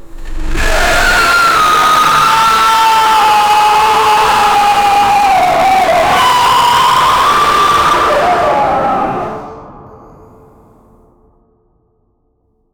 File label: a very disturbing sound p, crunching